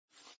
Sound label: slide, backgammon